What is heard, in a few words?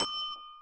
BB BEGIN permanent aliens; Giants